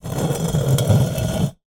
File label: Drag, Vase